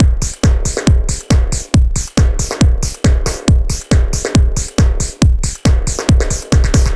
Dance Drumloop; Drums; Dance Beats

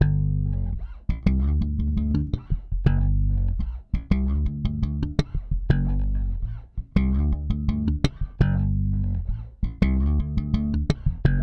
Electric Bass; Basses

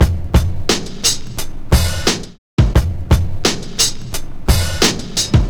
Old School Break, Drums

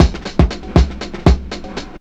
LOOP; SoundFactory; DRUMLOOP

